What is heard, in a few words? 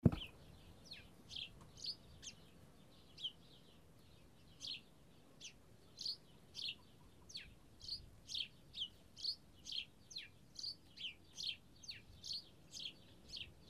Birds Kakopetria Cyprus